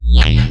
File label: Synth Shot; Vengeance Samples